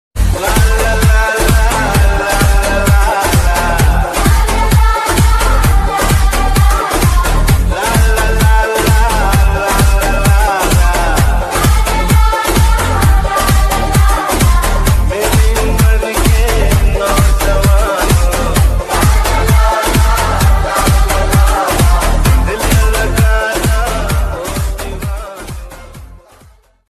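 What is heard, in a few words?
background music ringtone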